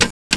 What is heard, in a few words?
CLICK